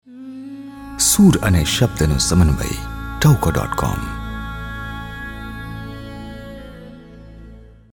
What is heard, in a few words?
Classical, tahuko